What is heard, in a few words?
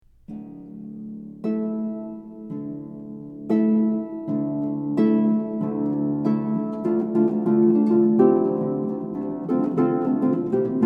Temple Rock